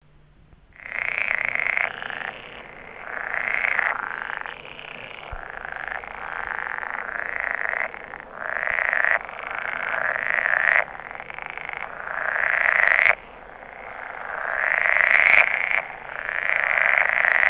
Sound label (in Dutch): poelkikker paringsroep